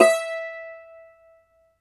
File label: Cumbus Saz; Plucked Strings; E-MU Producer Series Vol. 7 – Old World Instruments